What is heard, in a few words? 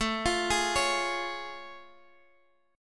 A Augmented Major 7th A, Guitar Standard; arpeggiated; JGuitar